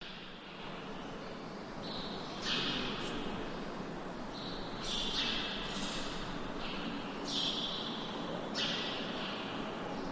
Israel Tomb, Interior Reverberant Stone Room, Birds In Rafters